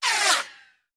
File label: Bullet Pass By; Sound Ideas; Guns